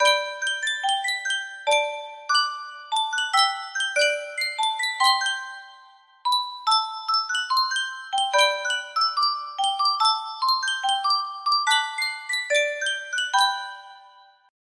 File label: sankyo music box